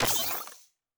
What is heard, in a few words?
Door, Sci-Fi Sounds